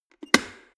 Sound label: plastic water boiler close lid fast